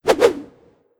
Ninja Attack Double

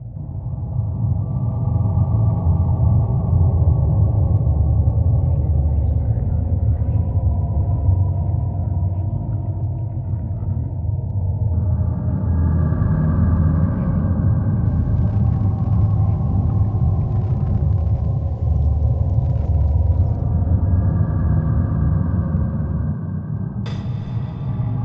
Ambience

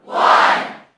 Sfx Basketball; Sfx Basketball Breaker

